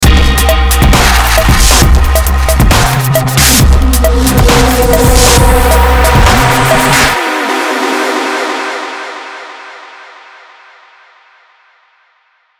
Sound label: hiphop